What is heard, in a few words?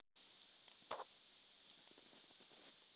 silence; nokia e52